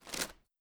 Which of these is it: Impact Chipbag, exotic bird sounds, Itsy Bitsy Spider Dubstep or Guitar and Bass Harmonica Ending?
Impact Chipbag